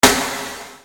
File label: Snare Dr